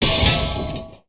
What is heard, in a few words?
Welder Smash; ambience gnarled forest